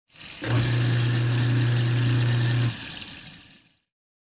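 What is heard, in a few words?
Garbage Disposal Unit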